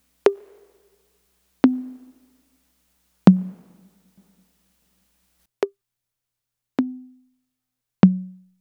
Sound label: Congas